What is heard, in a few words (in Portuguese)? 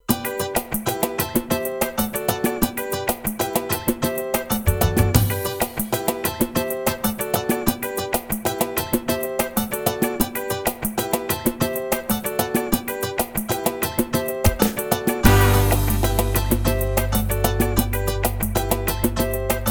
Samba Swing de Rua